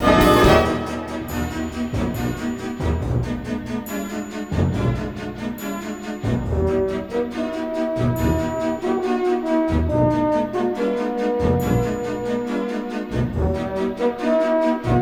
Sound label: Boss fight; Mighty Mouse My Hero